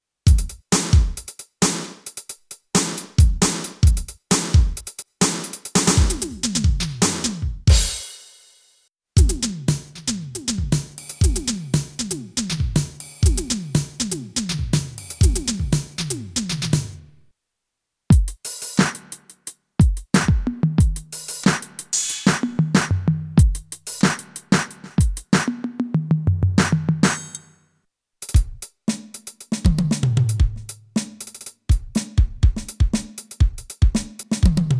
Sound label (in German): Yamaha DD-75 Elektrische Drumsets